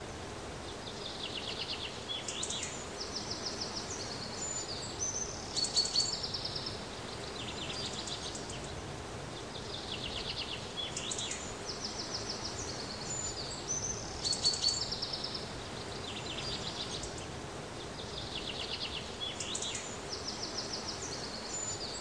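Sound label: Scottish valley; the 39 steps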